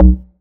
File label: Syn Bass; Equipped Music